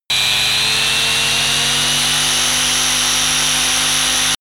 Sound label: saw compact free